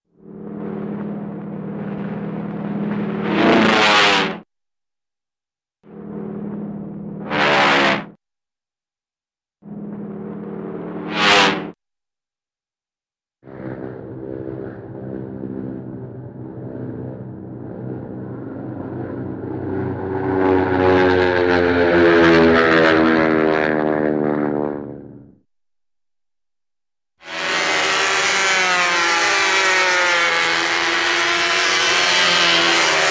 Single Engine Prop Plane Dives; Hollywood Edge Cartoon Trax

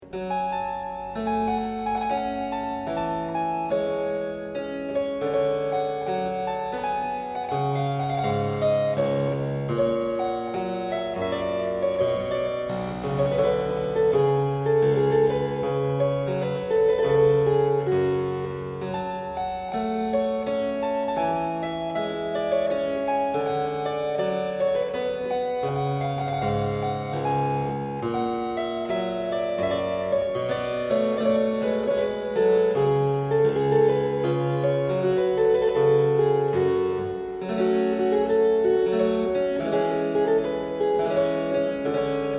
Suite in G Major Ground part 2, Keyboard Music; Colin Booth; William Croft

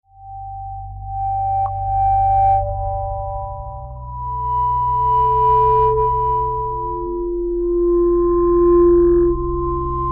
Click Melodic; Beds

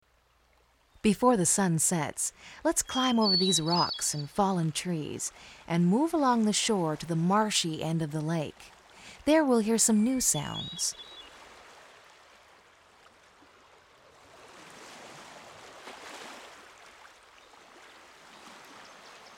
Northern Flicker

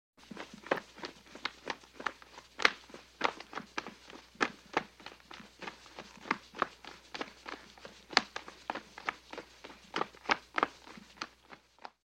Tennis Ball Machine Steady; Sports

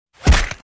Kick Body Hit With Thud; Fights Bodyfalls

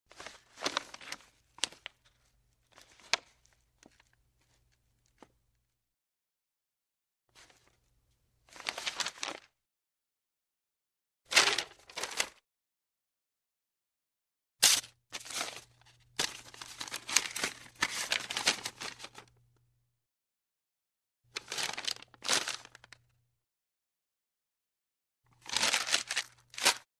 Paper Parchment Rustles; Hollywood Edge Foley Sound Library

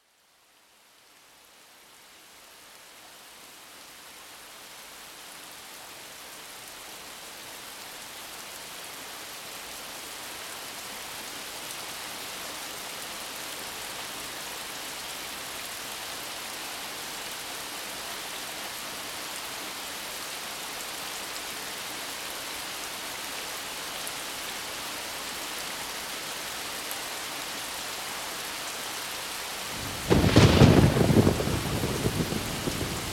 Thunderstorm; Nature's Many Moods

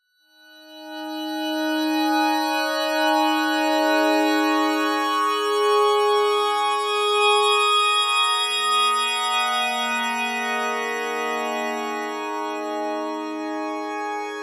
FM Melodic

